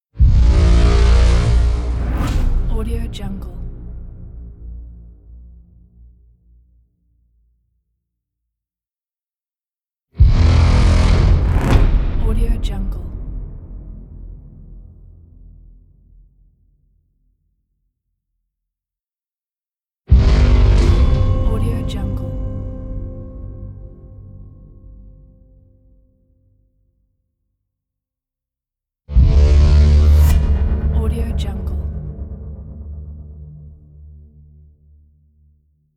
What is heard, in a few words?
trailer call for war sfx pack